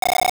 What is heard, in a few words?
Game Prepare; The next set of targets being prepared at Target Practice; Zelda Oracle